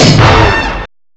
ORCH, STRINGS; STRINGS3

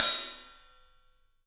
TPAH14, 3D Drums, LITE TIPAI H; 3D Sound

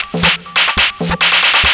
drum 138, drum-loops, loop-grinder, Techno

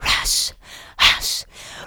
VOCA, Houseworx

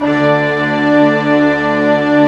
Orchestra; 90s Sample CDs; Club-50 - Foundations Roland; Sy Str

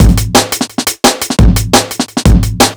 E Beat, Electronic Beats

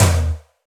Rock Tom, Roland BK-5

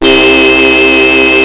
OB 19 C3, Rare Synthesizer; Hollywood Edge - Giorgio Moroder Rare Synthesizer Collection; OB 4 VOX.

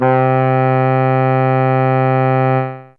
Del Sax 2 C4, Fairlight CMI IIe Reeds

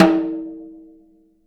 SN OFF DEEP, snares; Sampleheads; Peter Erskine Living Drums

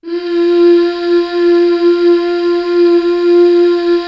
MMHH, BACK. MMMHH Fem

